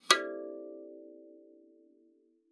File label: KALIMBA D5; Xsample VOL-7